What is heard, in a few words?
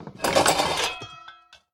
Junk Underlay Attack